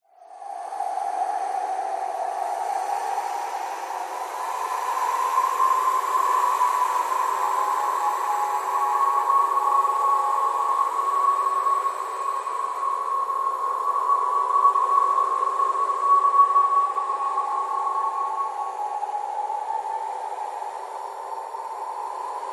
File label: science fiction mysterious cold wind planet